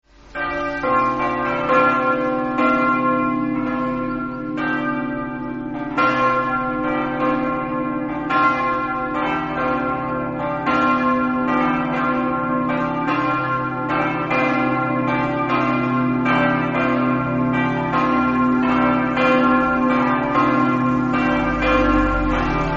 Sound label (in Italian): prema, campane